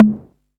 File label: low mid tom drum sample g sharp